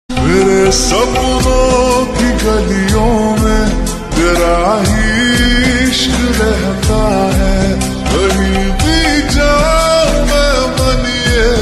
ringtone romantic ringtone lofi love lofi bgm ringtone lofi reverb remix